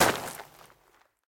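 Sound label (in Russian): слабый спокойный удар по сухому бревну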